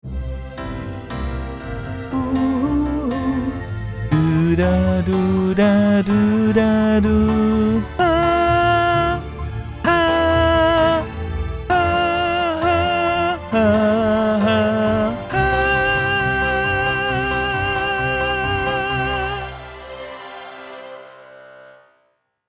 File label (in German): Sei hier Gast Tenor